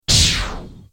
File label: collide